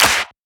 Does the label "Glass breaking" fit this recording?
No